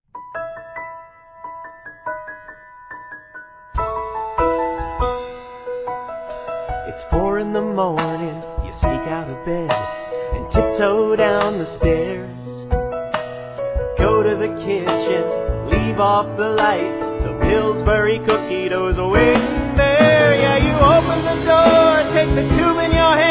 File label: Rejected Commercial Jingles; Pillsbury Cookie Dough